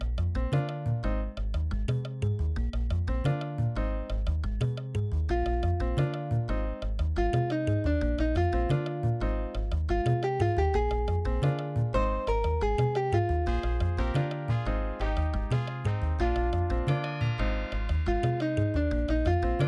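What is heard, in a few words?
level1, Ensoniq Sounscape S-2000; soundcard test; Hocus Pocus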